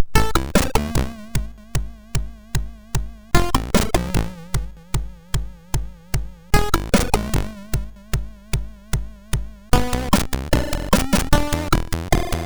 sid, MOS6581R2

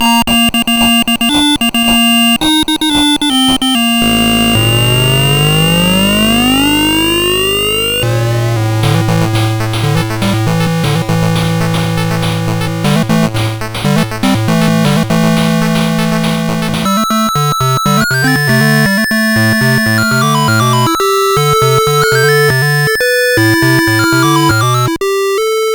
Musicbox, sid CSG8580R5

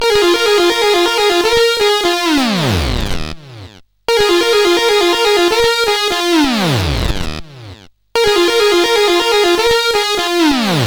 Blues, CSG8580R5; Spike Super Blues Experience

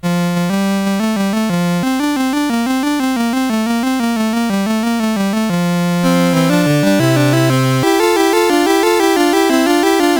Fugue CSG8580R5; Magnificat Fugue

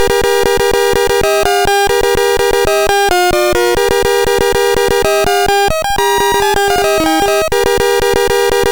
Dragons Den, MOS6581R3

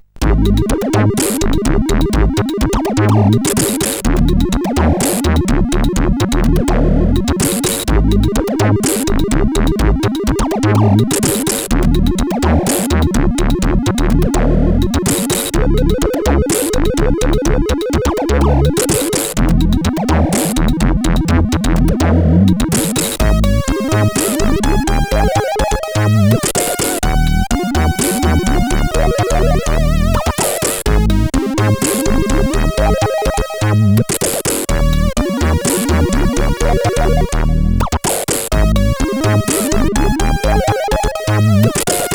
Notetune, sid CSG8580R5

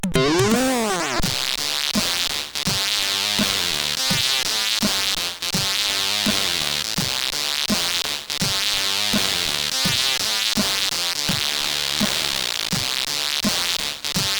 Jingles, sid CSG8580R5; Deek

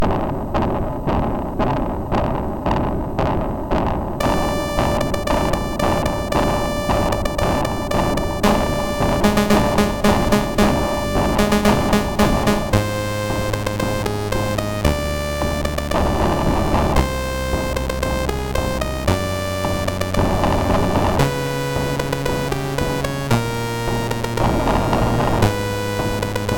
sid MOS6581R4, GAMES; Combat Leader

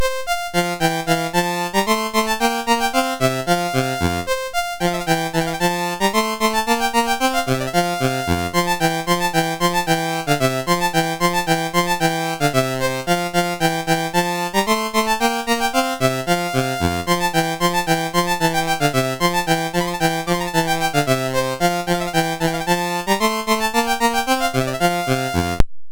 Allegro in F Major, MOS6581

